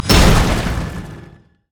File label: ripple effect; nautilus